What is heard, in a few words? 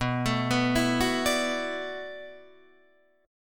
B-Minor 11th B down Guitar Open D